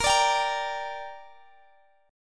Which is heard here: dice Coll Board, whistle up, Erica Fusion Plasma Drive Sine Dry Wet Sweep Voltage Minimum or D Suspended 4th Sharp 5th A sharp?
D Suspended 4th Sharp 5th A sharp